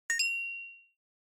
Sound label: Inspiration Low